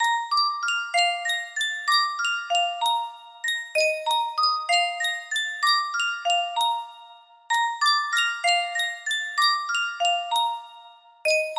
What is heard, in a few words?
Yunsheng custom tune music box